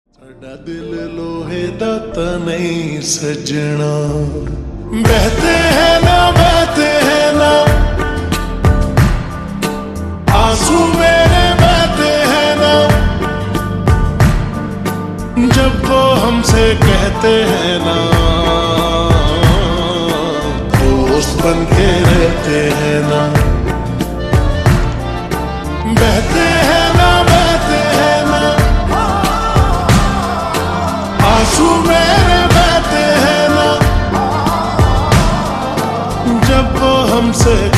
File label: Slowed Reverb Ringtone